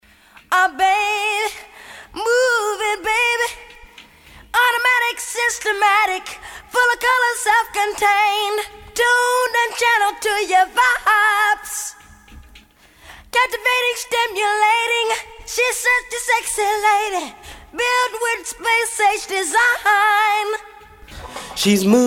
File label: Acapella